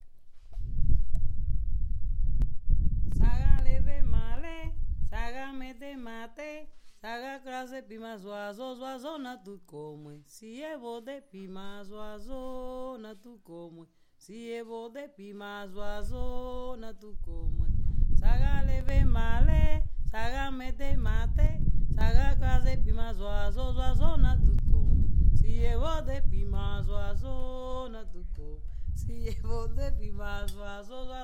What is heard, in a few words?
merengue